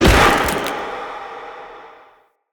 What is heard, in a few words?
Mockery Collapse Husk Trap; Enemy; Foley; Mockeries; Characters